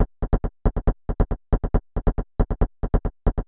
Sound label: delayed, techno 138 bpm; Best Service ProSamples vol.54; AKAI; CHICAGO 5 O